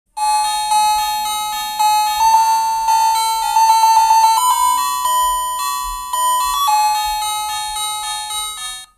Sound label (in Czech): melodie